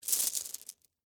Marble Stones Up, Texture, Marble, Stones, Pick, Up, Handful; Miscellaneous Toy Sound Effects